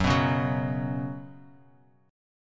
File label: Gb Suspended 4th, Bass Standard; strummed; 14,14,11,11; JGuitar